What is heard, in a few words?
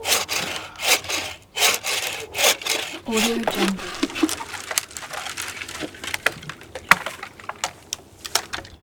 saws handsaw cutting tree breath; construction; urban sounds